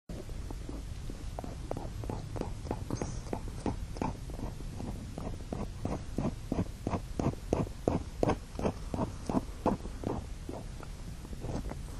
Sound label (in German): Backen Zaehne Wetzen, biber